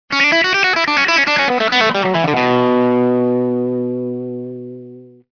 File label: riff fast